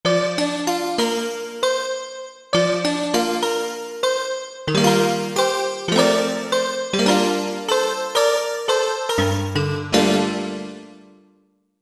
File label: Fantablock, Korg MSC-12S Synth; Synth 3